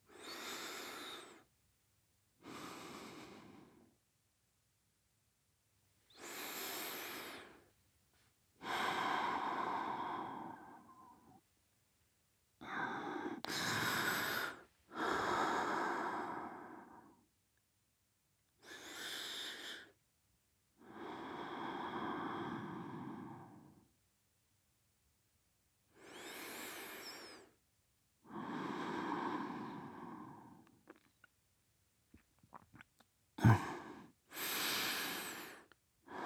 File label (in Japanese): 寝息ボイス